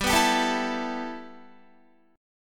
G 6th Add 9th G Guitar, chord; G6add9 strummed; 15,14,17,14,16,16

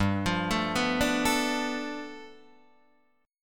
G 9th, Guitar Open D

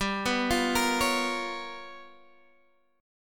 G 7th Sharp 9th Flat 5th G, Guitar Open D; down

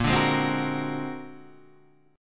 A sharp Augmented 9th; A#+9 Chord {6 5 6 5 7 6} strummed

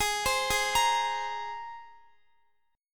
Ab Major Add 9th, Guitar; arpeggiated; x,x,20,17,20,18; down; 4F,4A,5C,5G,5C,6E

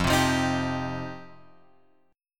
F 7th Suspended 2nd; F7sus2 Chord {1 3 3 X 4 3} strummed

